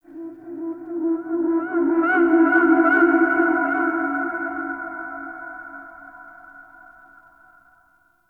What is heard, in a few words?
Dark Wind Blow; Tau